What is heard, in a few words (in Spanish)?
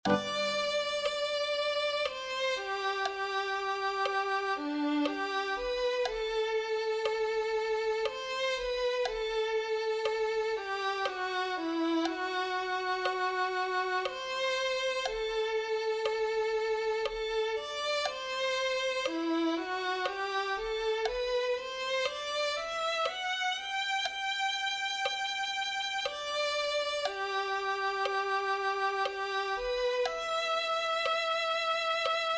violin